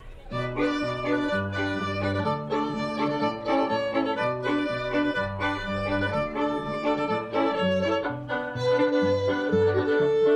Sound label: strings, live